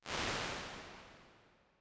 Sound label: Large Hall, Lexicon 480L Reverb; impulse responses; Big Gee's